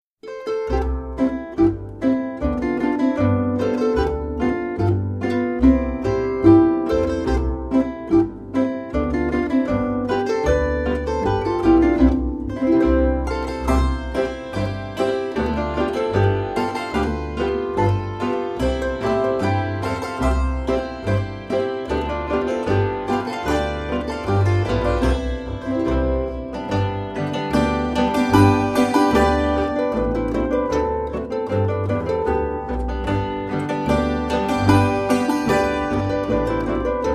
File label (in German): Tanzmusik Saitenquintett Boarischer